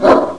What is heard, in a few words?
WOOF